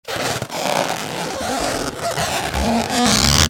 TOYS

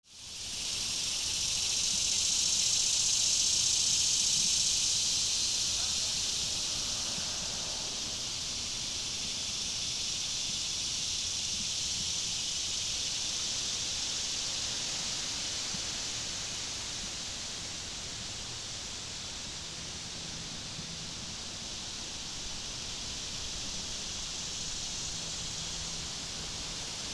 ANIMALS

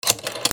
PHONES

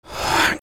FRICTION

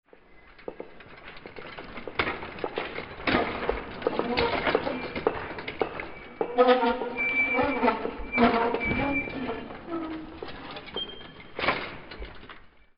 DOORS

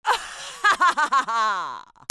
PEOPLE

